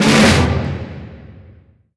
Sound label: ui arcade zero death; user interface; Call of Duty Modern Warfare